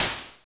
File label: HH, HH Synth